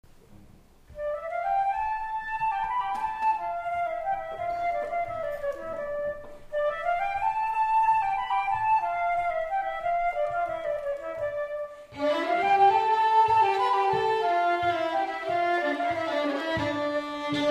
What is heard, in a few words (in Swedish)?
norsk schottis